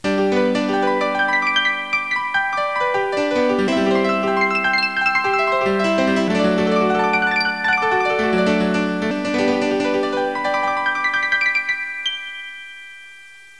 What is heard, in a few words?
strum